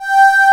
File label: VO G4, ANA VOX, SYNTHESIZER; AKAI S6000 CD-ROM - Volume 1